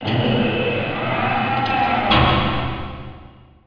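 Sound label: ambience crane winch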